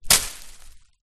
impact stick throw into bushes hard

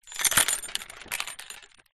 metal chain swing park shake movement; foley

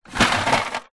household freezer ice cube plastic tray close; zapsplat

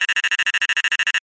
science fiction scanner scanning tone

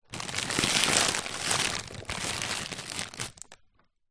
household freezer packets slide movement inside

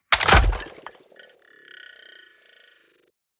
Impacts designed; soundbits

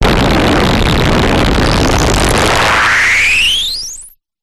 Glitch Decimated; Glitch Factory 01; sound spark

